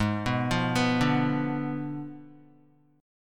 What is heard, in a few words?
G sharp Diminished 7th G sharp, Guitar; G#dim7 arpeggiated; JGuitar